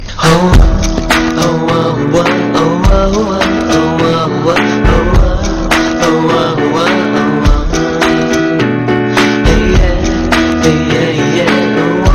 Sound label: theme piano